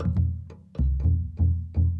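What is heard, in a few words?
Calm Far, music; From Dust; ps3; xbox 360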